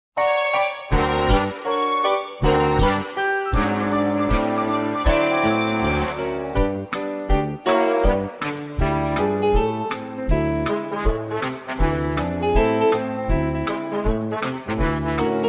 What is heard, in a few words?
ACCOMP